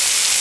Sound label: Iron burns; Kite